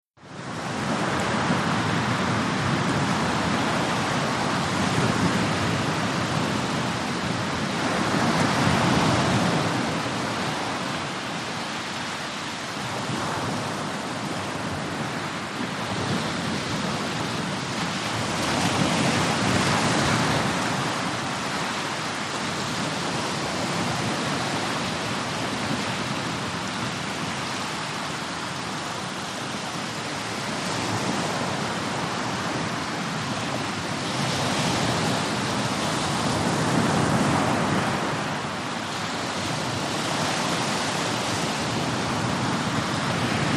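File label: Medium Surf Wide Beach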